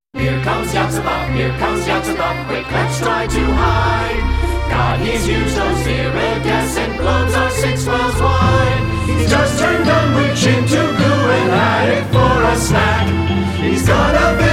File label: Children's Chorale; christmas